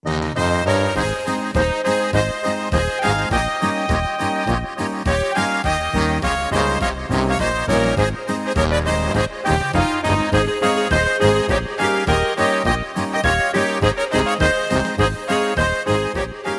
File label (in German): Boarisch Hitmedley